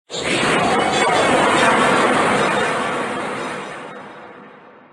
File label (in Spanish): Grito; Duraludon Gigamax